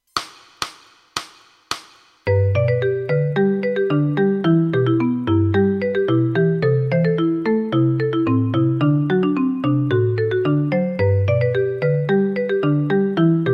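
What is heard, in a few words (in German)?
Etüde in G-Dur, Stücke mit 4 Schlegeln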